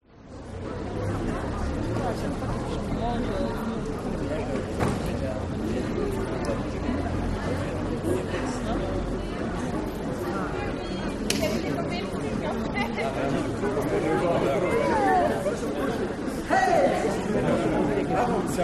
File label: France Strasbourg Place St. Etienne Walla